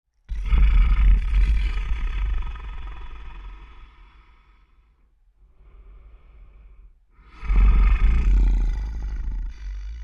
Creature Large Breaths Resting Grumble; Scary Breathing; Big Room Sound

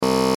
Buzzer Button, Buzzer Button Signal Loop; Multimedia; Blastwave

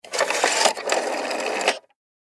Technology Telephone Rotary Dial Number 7 Antique

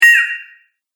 Android Question Beep, robot; Robot Sound Effects

